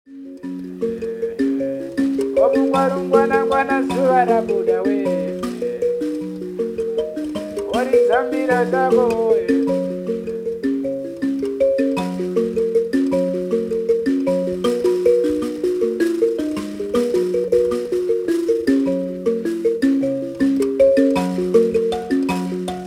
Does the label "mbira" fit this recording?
Yes